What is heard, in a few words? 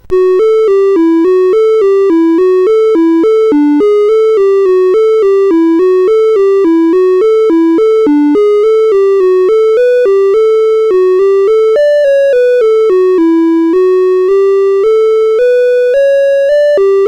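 Quasimodo, MOS 8580 SID chip music, games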